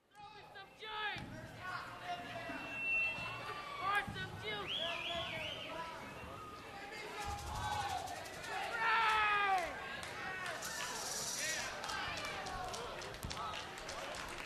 The Crowd Requests Their Favorites

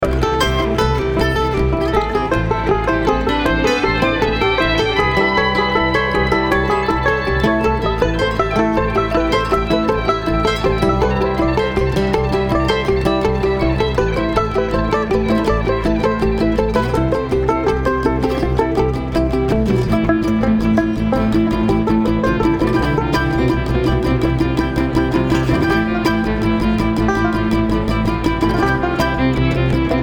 Traffic Jam

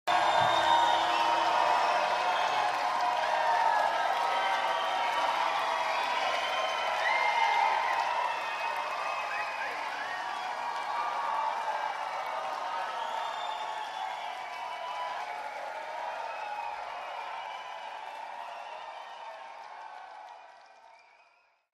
Crowd

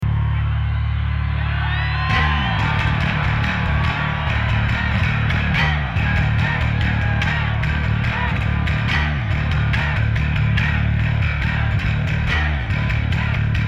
Bass Solo

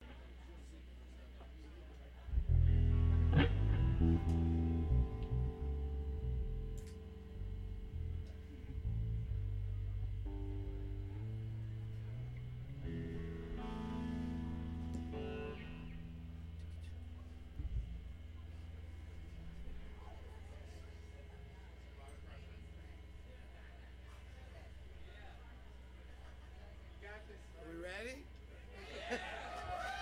tuning

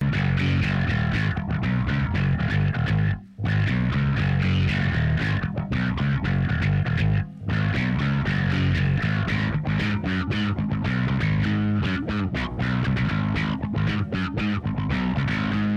Bass Guitar Doodle

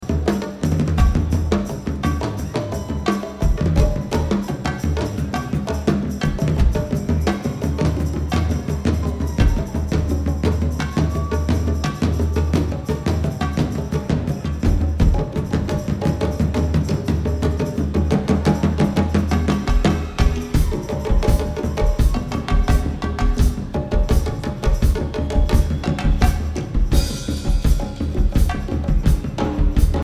Drums

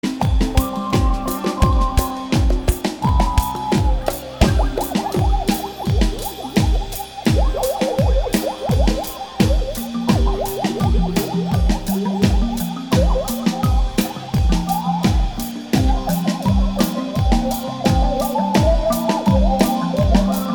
Bass and Drums

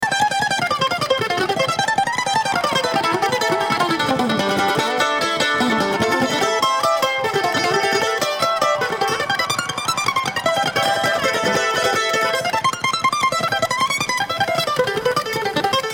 Kentucky Mandolin

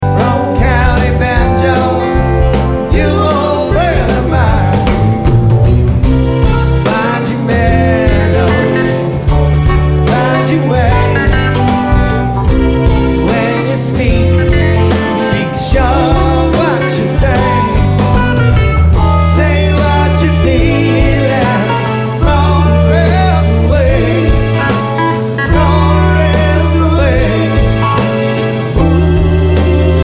Banjo

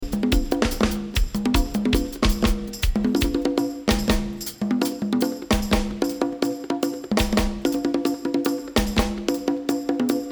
Drums

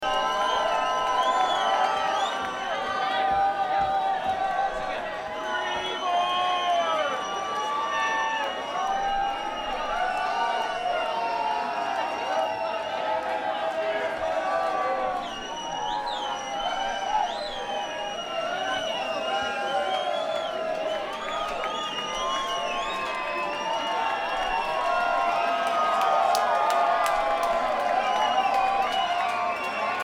Crowd

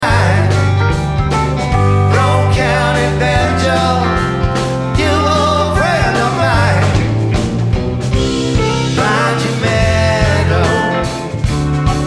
Banjo

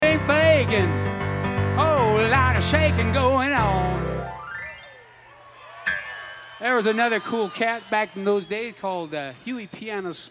Piano Moment

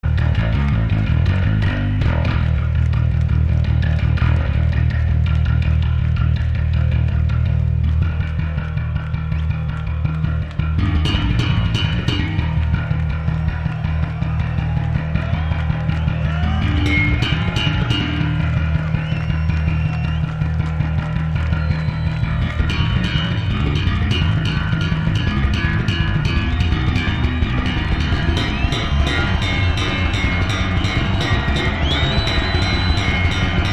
Bass Solo

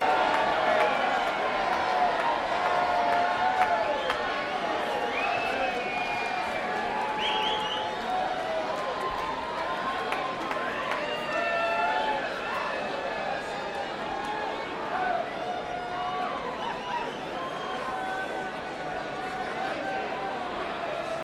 Crowd

